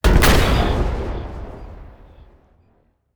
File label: Distant Sci-Fi Explosion; Geometry Dash SFX Library